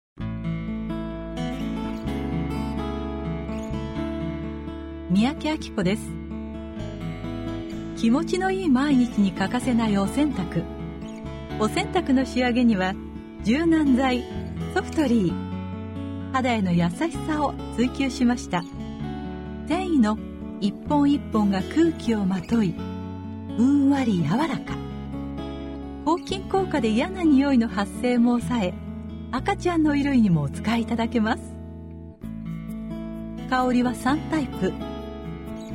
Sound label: BGM mix